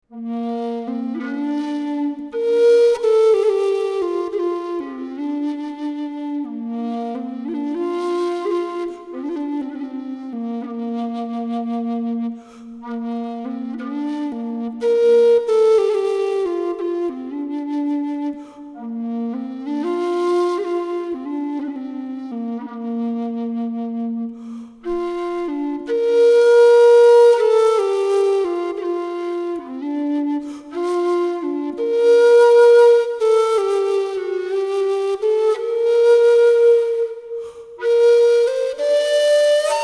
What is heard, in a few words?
Whistle Low Bb Air